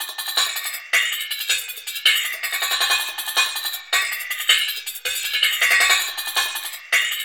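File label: jungle drum loops; Zero G; Malice In Wonderland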